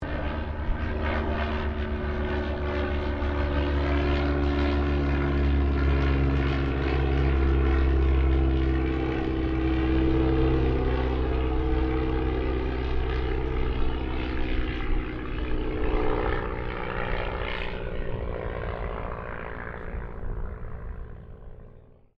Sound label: AIRCRAFT DH82A DEHAVILAND TIGERMOTH PASS